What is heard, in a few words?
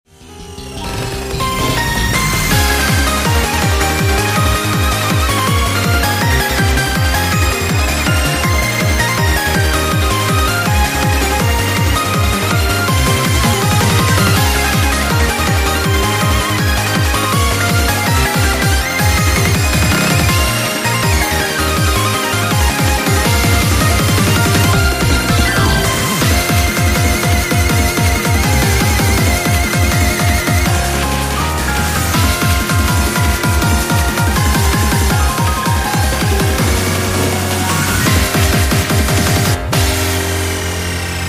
boss battle